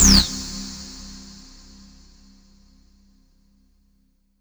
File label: BASS SlowRezzer FX; Polyend; Plughugger